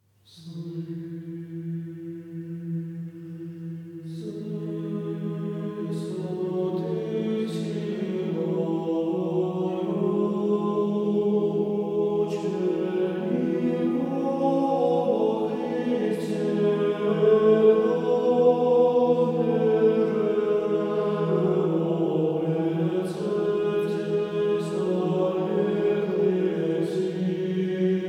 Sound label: Irmos; S vysoty siloju